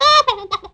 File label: Chicken Drop